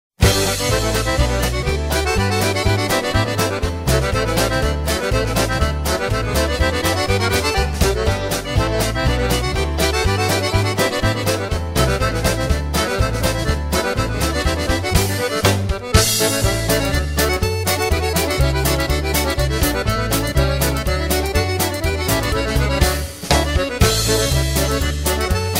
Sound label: Accordion Polka; Accordion Italiano